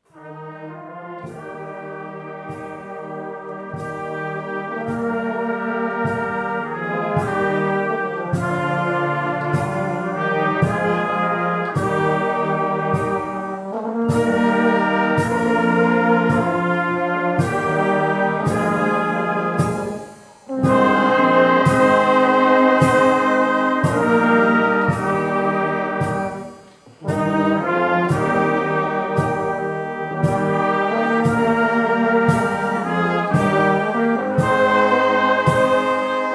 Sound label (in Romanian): Fanfara